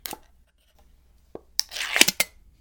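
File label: open pull tab can; cooking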